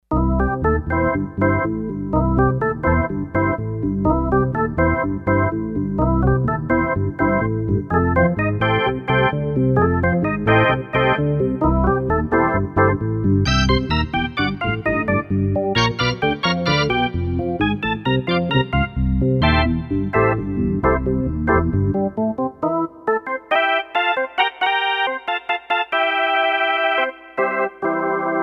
Elec Organ, Roland D-10; Factory Patches

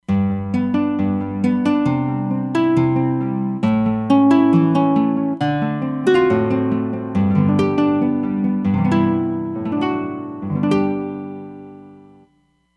Double Nylon, Roland Fantom XR

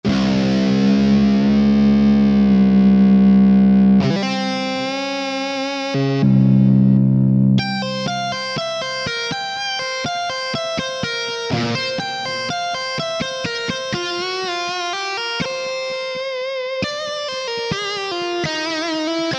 Fingr Tap'n; Roland SRX-03 Studio SRX

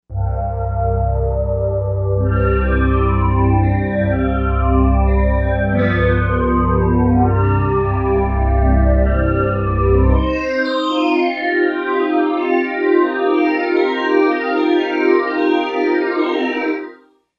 Wavesweep, Korg M1Rex; Factory Patches

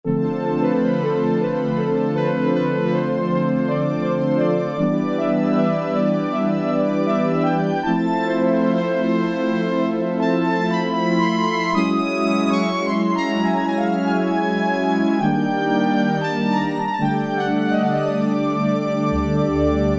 MELLOW PAD, Casio VZ-10M; Internal factory combi

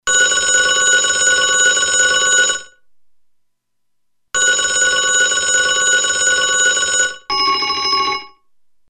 Telephone

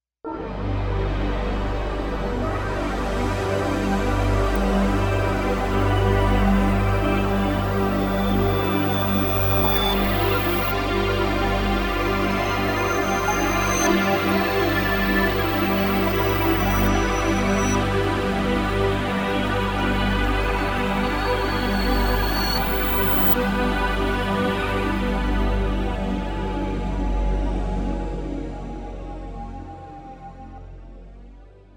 BUISY PAD, synth

